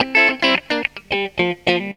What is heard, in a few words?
GTR, Funky Elements GTR RIFFS; Zero-G